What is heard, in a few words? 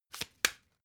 playing cards shuffle single